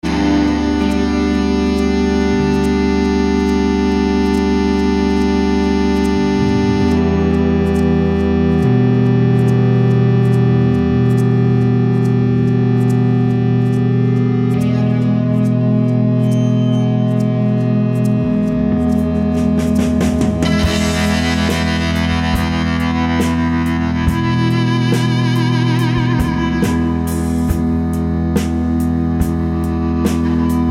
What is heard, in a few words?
Cool Feedback Soul overdrive